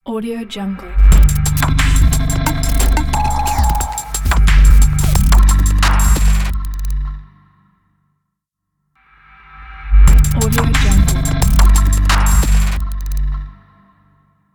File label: glitch electric logo